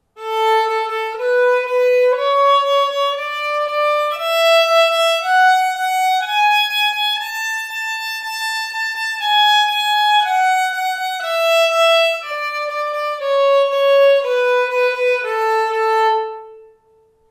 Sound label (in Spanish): la ritmos, escalas; mi primer libro de escalas